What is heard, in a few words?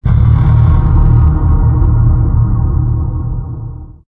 mysterious perc, halloween; Source Engine; tf